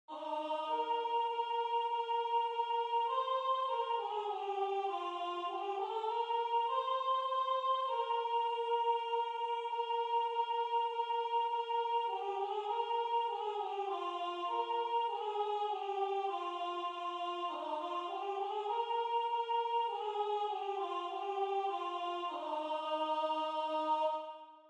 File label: Soprano